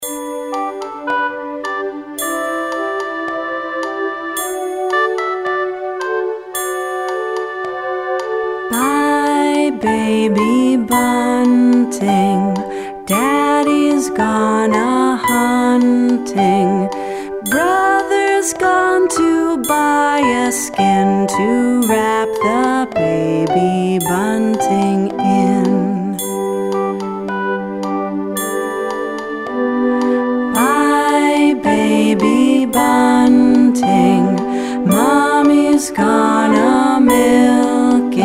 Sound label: Bye Baby Bunting